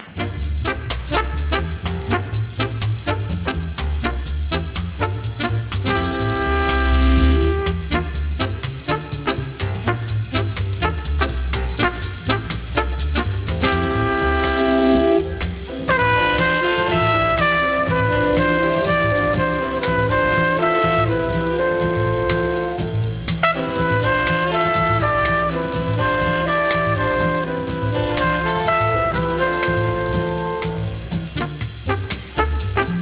piano

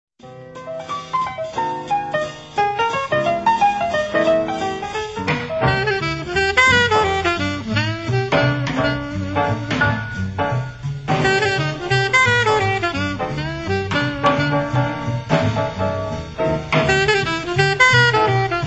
Cool Blues

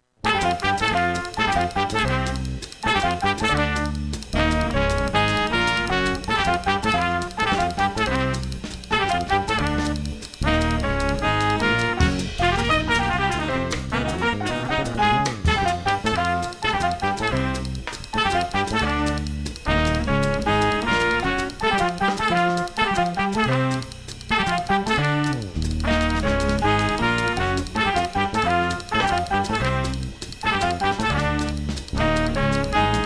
blues

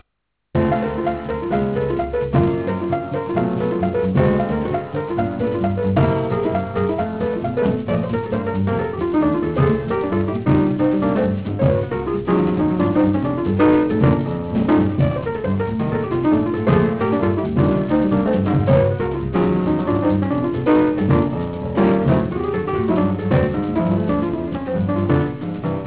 piano

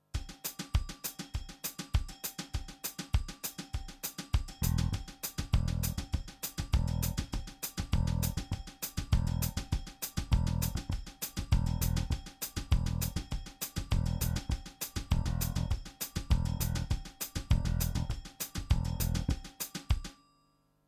surdo part

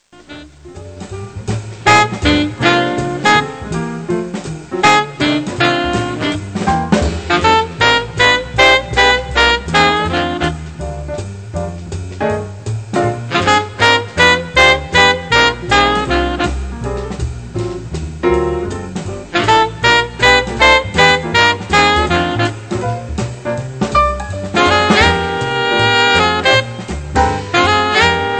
sax section; Jazz Conception for the Saxophone Section; 8 arrangiamenti per AATTB sezione di sax con sezione ritmica opzionale

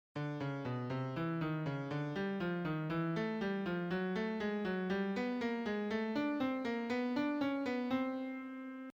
approach notes, jazz

